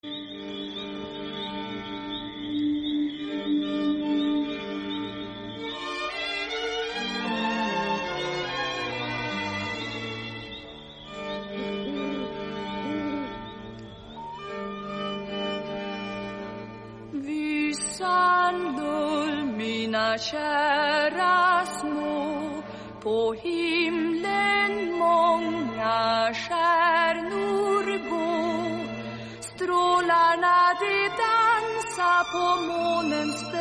swedish lullaby

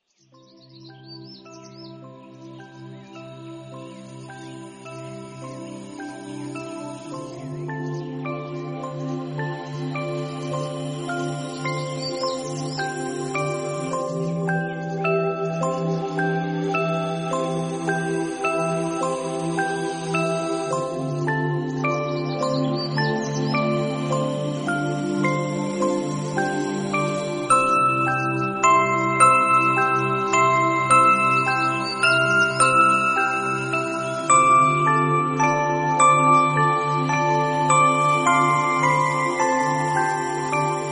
lullaby

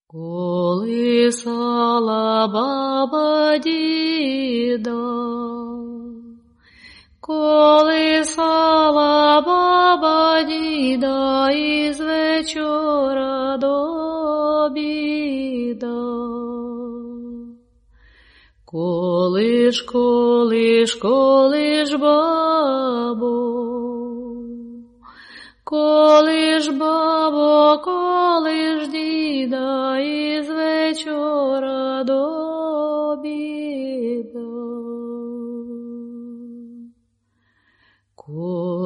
kolish babo ukrainian lullaby

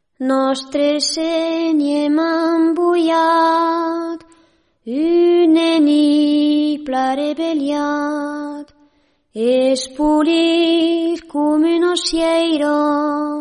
french lullaby

